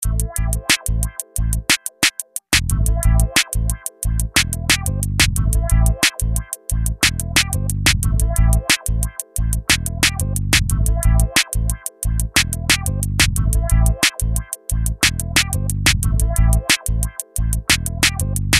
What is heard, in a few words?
beat